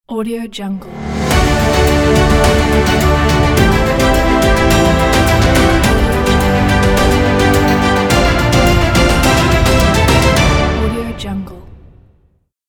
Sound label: opening logo